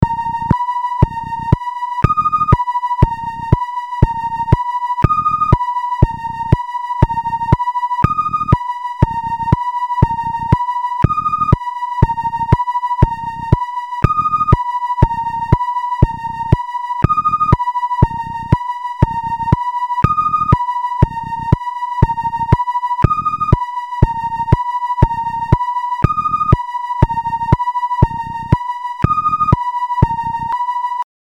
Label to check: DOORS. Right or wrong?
Wrong